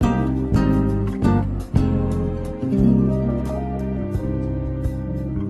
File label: guitar, loops; Phonky